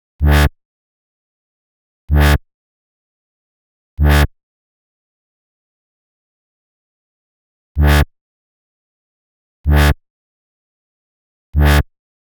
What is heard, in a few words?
127 G Bass, Complex Electro Synths